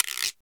PRC GUIRO; ETHNIC PERC; Roland - LA Composer vol. 1

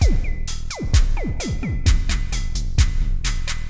Octave Down Loop 130BPM, DrumLoop Ghost Layers

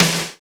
VIOLENT SN; BONUS SN2

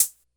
Closed HH, Drum Machines, Korg DS-10

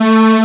STRNG; sound canvas

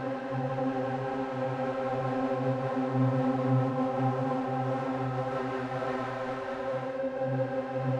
Drone; stereo toolkit samples; Non Tempo Loops

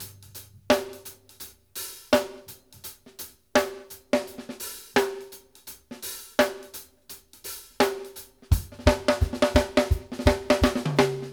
HANDS, GOGO 84; Sampleheads; New York City Drumworks VOL-1

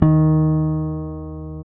E-Basses, Roland

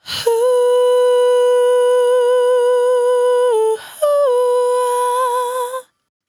100bpm Bm, Velvet Vocal; Zero-G

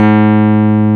PIA MKS20, E-PIANOS; Sound & Vision; Gigapack I CD 2

